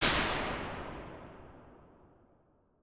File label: MEDIUM METAL ROOM, impulse responses